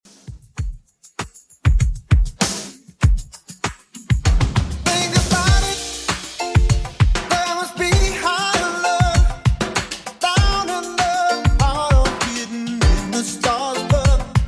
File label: 80s Redrum 98bpm